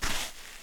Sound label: sand; firefight